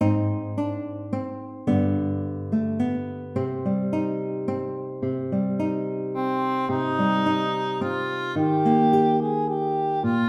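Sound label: greensleeves guitar english horn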